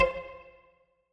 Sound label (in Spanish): Sample sonido Pluck